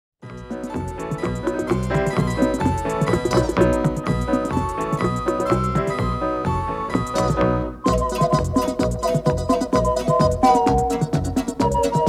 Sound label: Ocarina Man